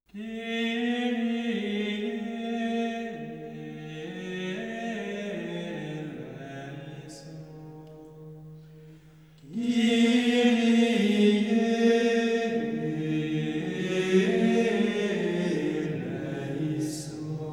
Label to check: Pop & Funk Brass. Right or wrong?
Wrong